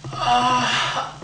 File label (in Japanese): 「アォ… ハッ」